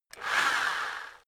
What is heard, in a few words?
rock drill rev reverse short